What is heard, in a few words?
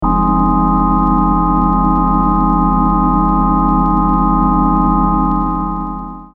6 Osc Dirt, Prophet '08